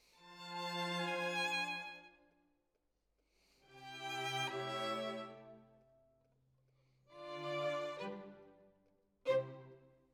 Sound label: Violin Solo